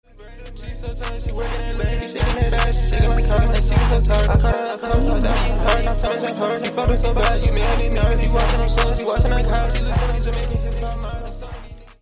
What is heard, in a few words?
party